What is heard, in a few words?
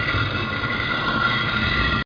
block sliding; Blade of Darkness